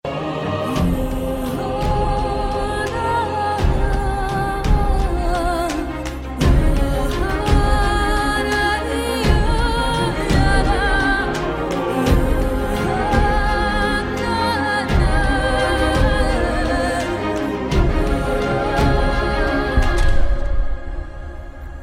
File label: Sad Love Mix